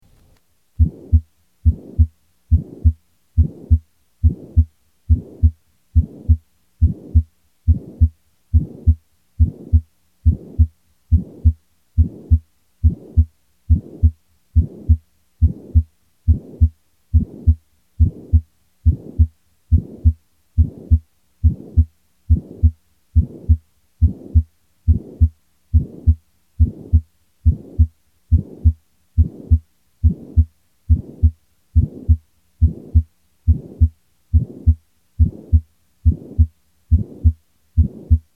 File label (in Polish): Szmer skurczowy z p max nad z pnia płucnego